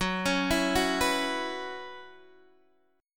Gb 7th Suspended 4th Gb down Guitar DADGAD, chord

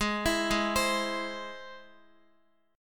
Ab Augmented Ab, Guitar, 4E, 4A, 5D, 5G, 5C, 6F; Ab+ arpeggiated; JGuitar

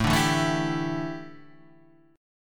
A 7th Suspended 4th, A, down

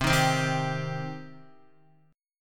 C Minor C down Guitar; strummed; JGuitar

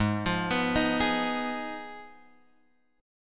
Ab Minor 6th, Ab, Guitar, Drop D; Abm6; arpeggiated; 6,8,9,8,9,x

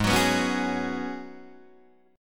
Ab Minor 11th, Ab, Guitar, DADGAD

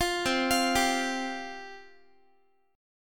C Suspended 4th C Guitar; Csus4 arpeggiated; 20,20,20,17,x,x

